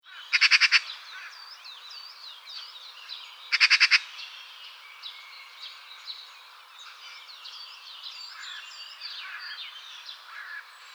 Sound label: Pica pica